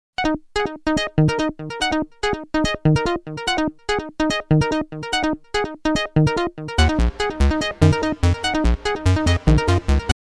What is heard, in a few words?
spacey funk